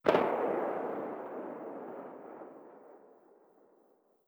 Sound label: gunshot a really distant; Rust